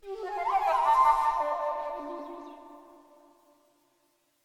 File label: Flute Trill C